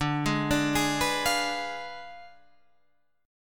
D Diminished 7th, D, down, Guitar Open G

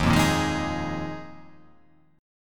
D sharp Suspended 2nd Suspended 4th D sharp

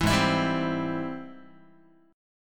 G sharp Augmented Major 7th G sharp Guitar; G#+M7 strummed; 7,4,7,5,4,x; JGuitar

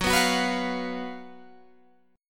F sharp Major 7th Suspended 4th, Guitar, Open G

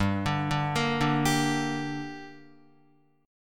G Minor G down Guitar, chord

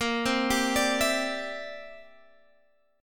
C Major Add 9th, Bb, down, Guitar, Standard; arpeggiated; Cadd9

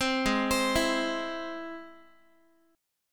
Ab Augmented Ab Guitar; 15,16,15,14,x,x; Ab+ arpeggiated

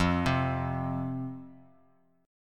F 5th F, down, chord; F5 arpeggiated

